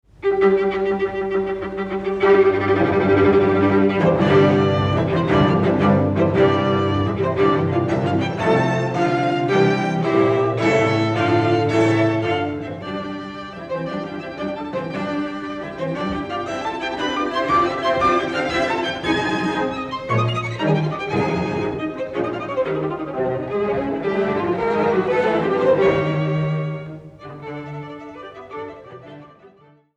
Quintet in C Major Op. 163 III. Scherzo. Presto; Schubert

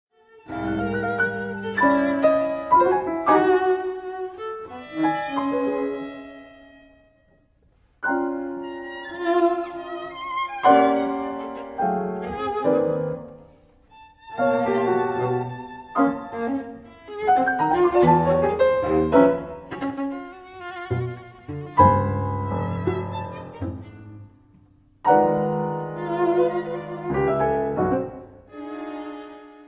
Piano Trio; Powell

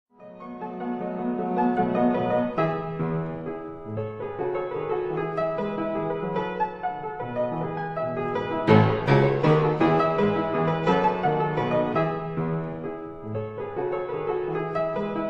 caprices, for piano; Amusementes (3) en forme de caprices; Op. 105; a la Suisse

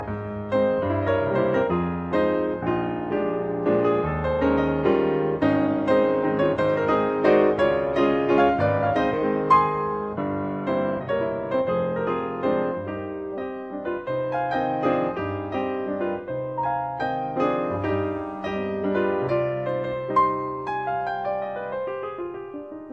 Mazurka in A flat major; Mazurka No 37; Op 59 No 2; Paul Badura Skoda

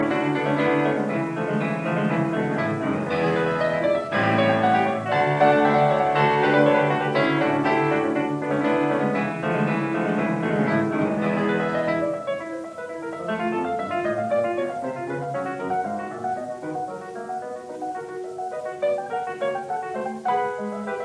Piano Pieces Capricco in B minor; 8 Piano Pieces Op 76; 4 Piano Pieces Op 119 No 3 Intermezzo in C major

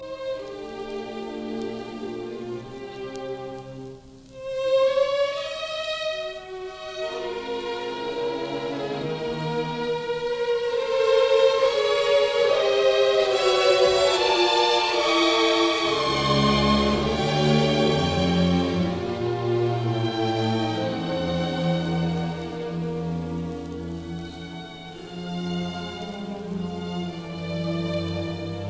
Colloquy, Symphony Orchestra; Hindemith; Symphonia serena; Philharmonic Symphony Orchestra

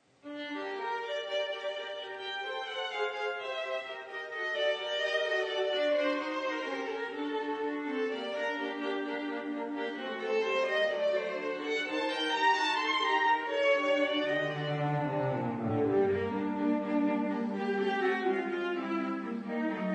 Viola Quintet in g Allegro

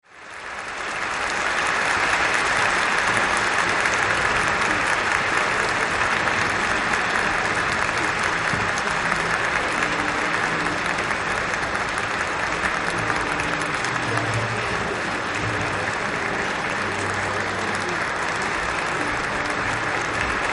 Applause

